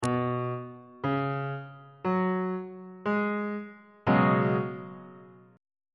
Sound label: d flat dominant 7th suspended 4th 3rd inversion on bass clef